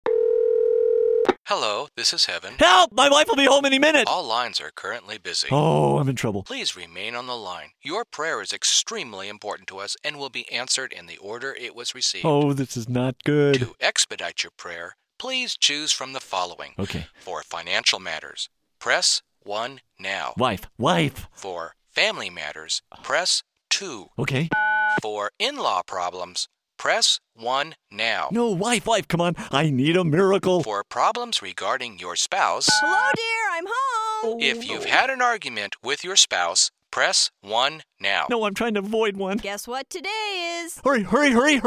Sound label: On Hold